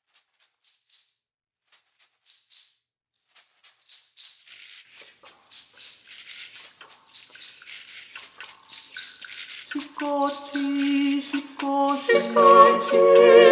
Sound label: tutti